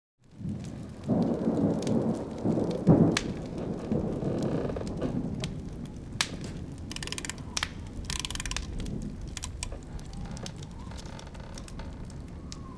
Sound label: Music Box